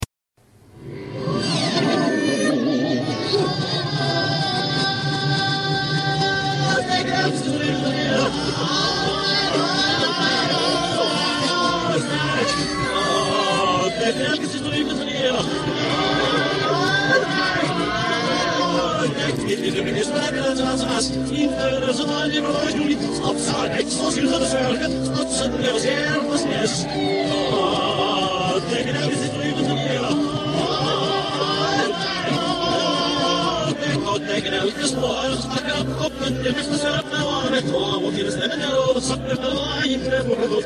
cat dog chant, reverse

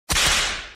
whip; cave

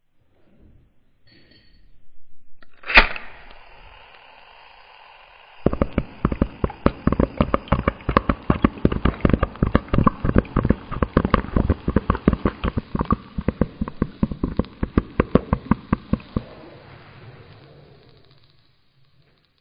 bong; vapesquared

